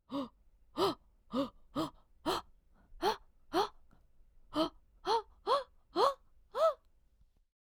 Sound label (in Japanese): uragoe